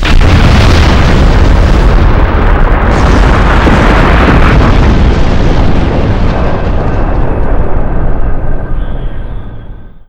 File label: nuke; quake2; kitsune